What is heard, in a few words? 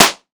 airy snare f key; Duration 0:01; drum single hits one shots; snare drum samples